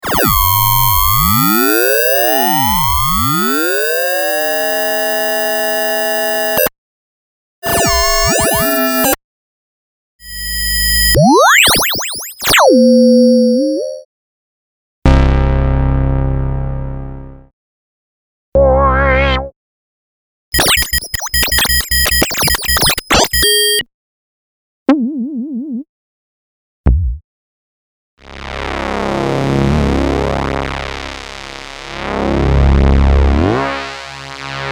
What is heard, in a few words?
Moog Modular